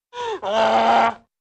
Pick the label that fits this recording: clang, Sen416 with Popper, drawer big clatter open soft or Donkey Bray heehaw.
Donkey Bray heehaw